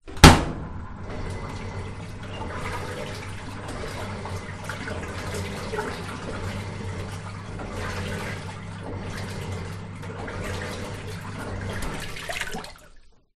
household washing machine top loader lid close operate empty